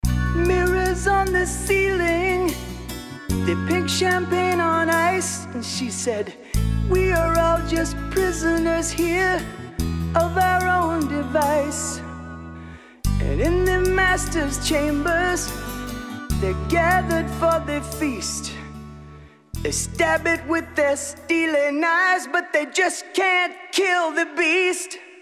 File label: reprise solo guitar